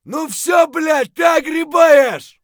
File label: fight; Escape from Tarkov